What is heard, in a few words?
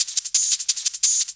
Shakers 174